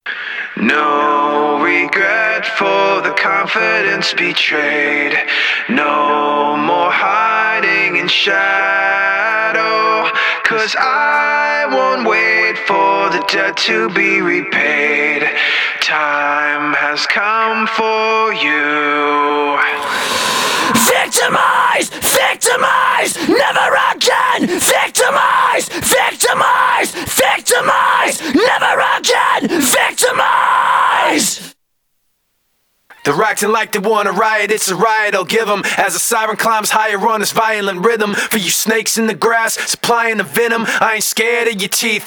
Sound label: (Acapella)